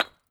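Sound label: brick, steps; Terrarum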